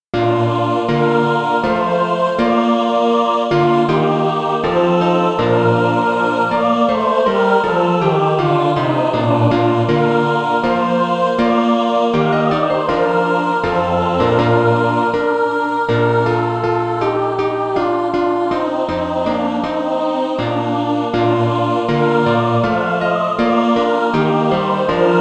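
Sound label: mixed, Practise Music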